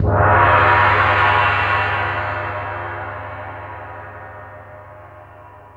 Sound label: DP GONG LO